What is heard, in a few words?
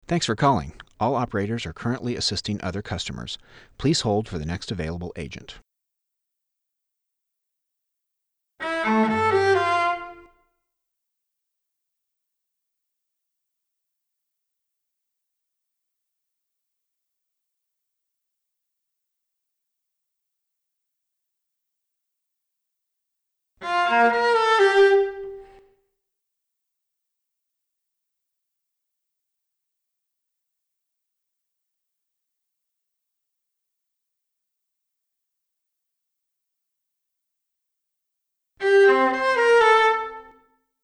cello